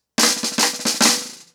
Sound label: Snare 110BPM, ACOUSTIC DRUMS; Sonokinetic - The Roots Drumloops